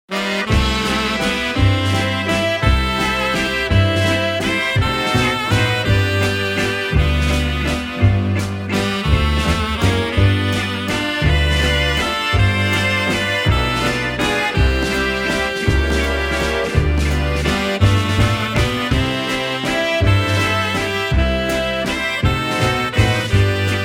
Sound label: Waltz